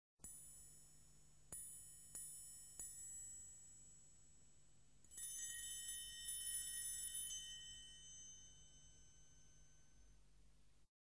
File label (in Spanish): Triángulo